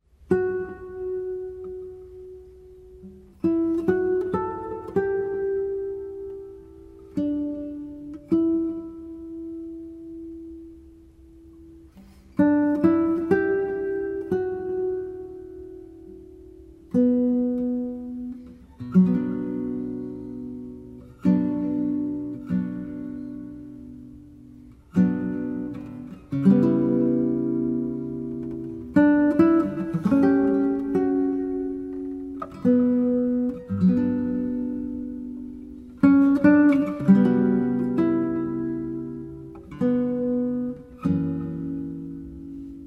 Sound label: Guitar; Homage to A. Scriabin